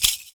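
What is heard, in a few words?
PERC, percussions; Univers Sons - Raricussions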